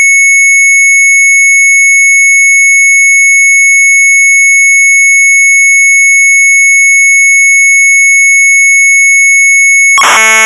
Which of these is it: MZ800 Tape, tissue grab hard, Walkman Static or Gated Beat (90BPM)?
MZ800 Tape